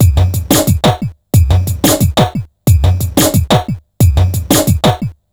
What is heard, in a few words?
bassline drum 090bpm; Zero-G; Downbeat Dance Grooves